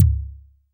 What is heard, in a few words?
Kick, Clavia Micro Modular